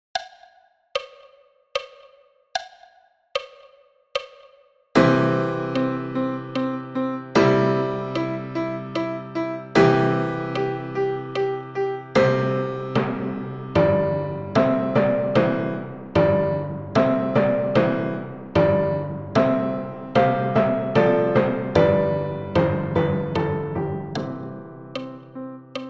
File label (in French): Fanfare tutti a 75 bpm